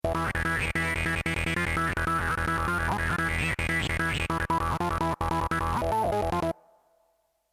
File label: Leadsynth